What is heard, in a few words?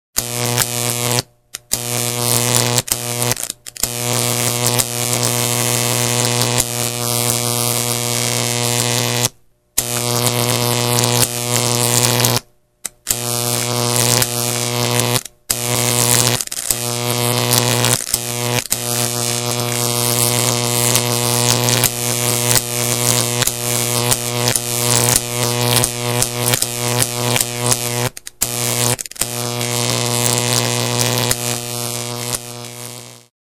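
sound of electricity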